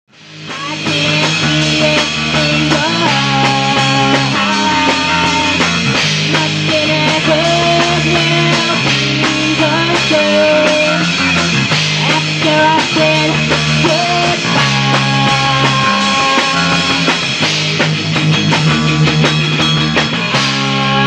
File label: theme song